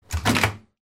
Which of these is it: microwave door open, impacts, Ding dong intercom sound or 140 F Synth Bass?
microwave door open